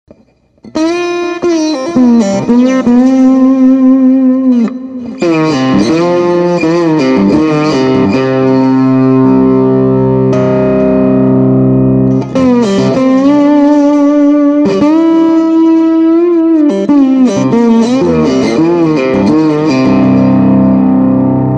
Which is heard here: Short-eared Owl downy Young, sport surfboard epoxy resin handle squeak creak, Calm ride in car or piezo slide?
piezo slide